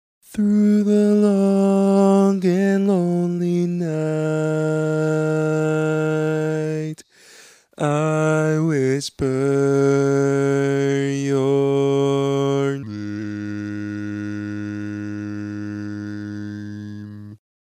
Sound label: Bass, barbershop